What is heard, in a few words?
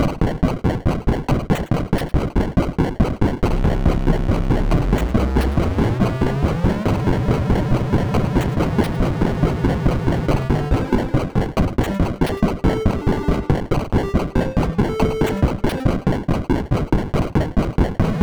beepbox song